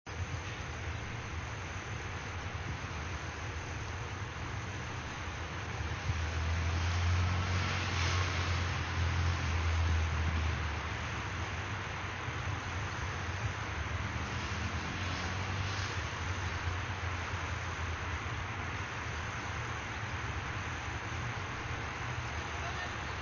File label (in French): ambiance parc urbain